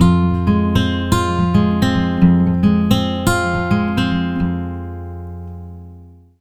110 MIN PICK F, Acoustic Guitar Loops; USB Soundscan vol.04 - Electric & Acoustic Guitar Loops; AKAI

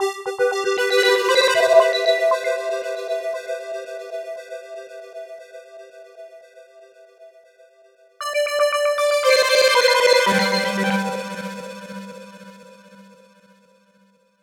Flittery, Synths, Modular Electronica; Sonic Foundry (Sony Creative Software)

